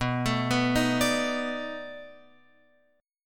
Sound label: B 7th Sharp 9th, down, Guitar, Open F; arpeggiated